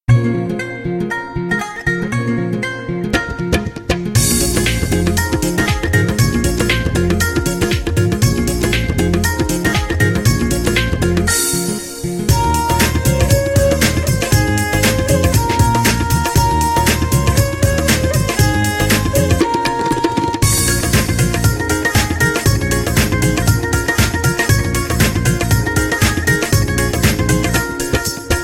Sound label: traditional punjabi; ringtone